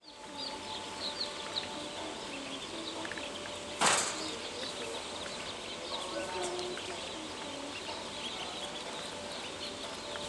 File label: humming with baby chicks